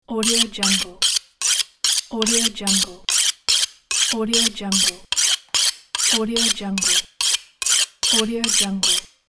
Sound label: fast knife sharpening